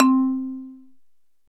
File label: MARIMB